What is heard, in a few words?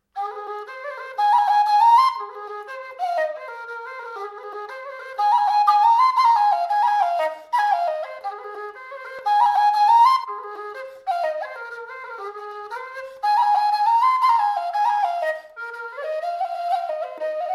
Low Eb Jig